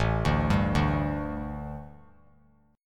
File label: A 7th Suspended 4th, A, down; A7sus4 arpeggiated